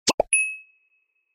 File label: success blip; math; time